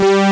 DUAL BASS; Pro-Rec - Synthsonic; BASSES

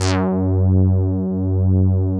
DRY BASS; Masterbits; Dance Trax

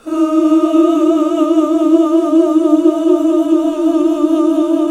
GOSP. OOOHH; Sound & Vision - Gigapack II CD 1 (Roland); HAL Gosp. Oooh 1

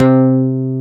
SMA, synthesizer bass; VFX-SD

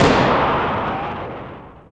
CANNON; INDUSTRIAL

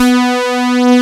OBX SAWS B3; E-MU Formula 4000 Series Vol. 3; Analog Odyssey; VK Instruments

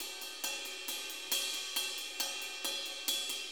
4-4 Ride Loop

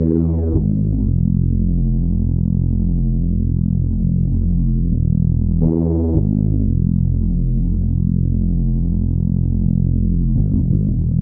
Drum & Bass

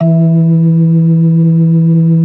B3 E 3; Best Service; Advanced Media Trax Modern Composer; B3 LES 1

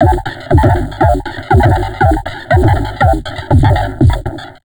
Drum Loops Crazy Processed

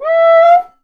QUICA LO

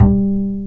BS UPRIT H, Jazz Bass Acoustic Bs; Roland LCDP02 Guitar and Bass

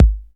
808 Kick, dry kicks; Quasimidi Rave-o-lution 309

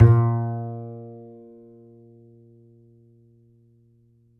Upright Acoustic Bass, 1ST POSITION; Larry Seyer

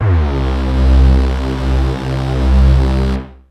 Techno leads, hits and riffs

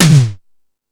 Fusion Stix, Drum Machines

